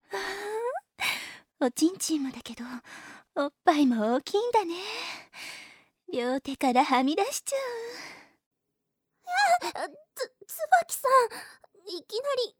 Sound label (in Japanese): かわりばんこフェラ, ASMR; サキュバス風俗2～花びら3P、大盛サキュバス～; 楓、椿